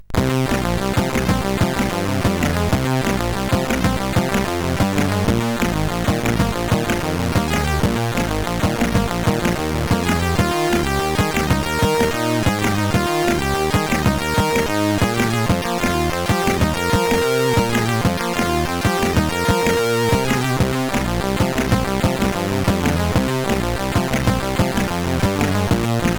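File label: Munch Load, MOS 8580 SID chip music, games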